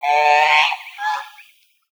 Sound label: Goose; Treasure of Nadia